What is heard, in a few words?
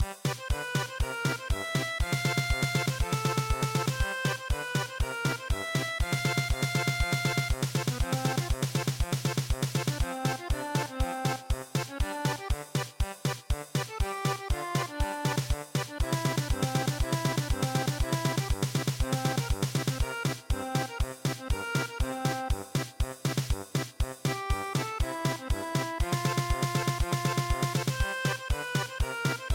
German Polka, traditional; E HULI MAKOU